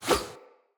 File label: Hunter Bow Melee Arrow Whoosh; Weapons; Player; Bows; EnvoyHunterBowRangedMelee